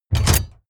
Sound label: mechanical switch metal medium push